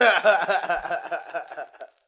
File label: laugh